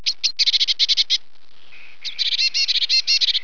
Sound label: parus caeruleus